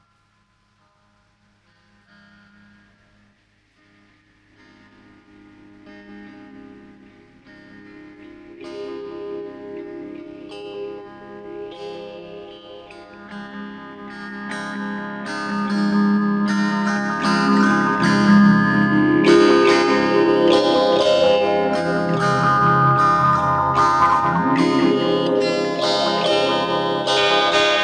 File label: A Secret with One Chord